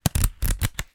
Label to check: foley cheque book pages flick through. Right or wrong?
Right